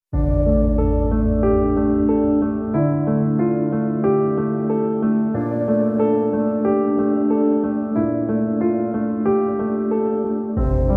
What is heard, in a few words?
Contemplative Wonder, Piano